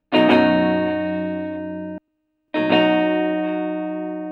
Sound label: chord mix